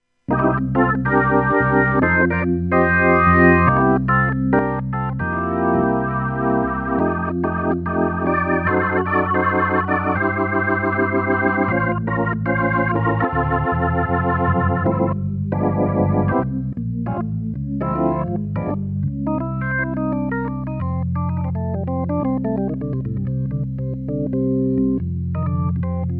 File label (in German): Orgel